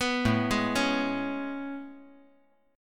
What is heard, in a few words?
A Major 9th A